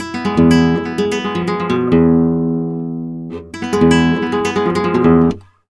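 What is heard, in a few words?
arpeggio guitar, Flamenco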